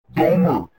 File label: domer low pitch; tiktok trends